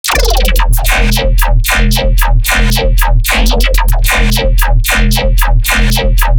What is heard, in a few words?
bass loop wobble 150 E; grandpaswobble; Virtual Riot